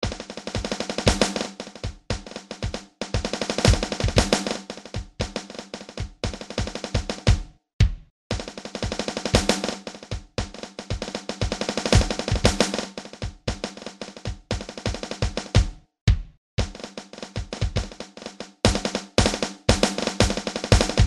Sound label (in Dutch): drummel